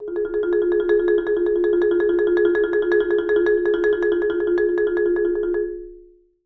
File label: G#3, Marimba; roll; Sampleheads - Dave Samuels Marimba & Vibes